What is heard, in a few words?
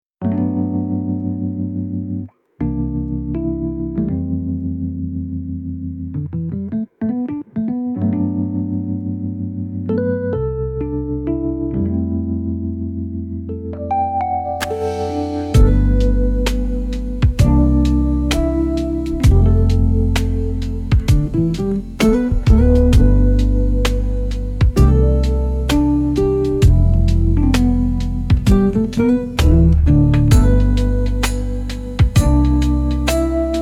skies and chill chill lofi jazz